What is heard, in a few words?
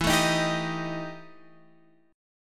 E Minor Major 7th Double Flat 5th Guitar Open F, EmM7bb5 chord; strummed; JGuitar